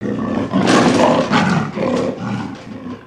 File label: Jungle Roars; gnarled forest